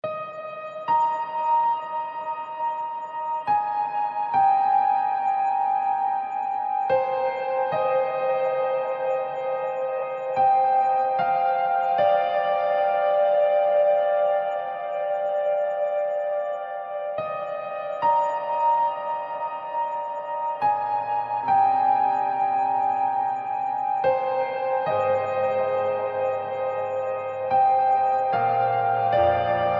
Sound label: Sitar Metal